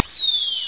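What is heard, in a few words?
space echo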